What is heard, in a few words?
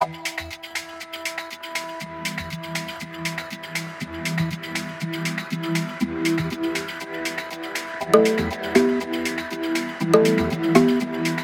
Modern Clubbing